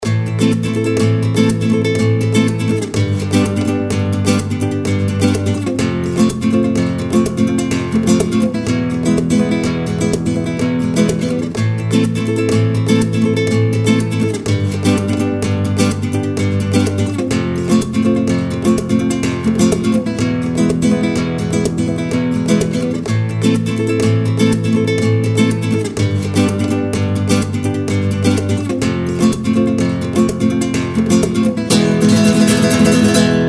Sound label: Guitar Chords